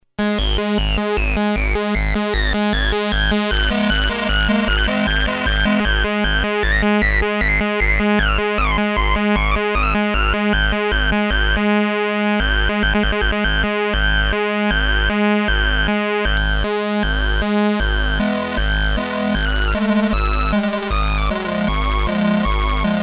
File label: sync, novation super bass station; test: OSC sync